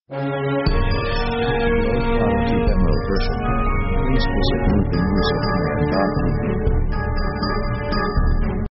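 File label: orchestral strange sketch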